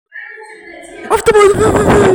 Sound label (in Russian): avtomat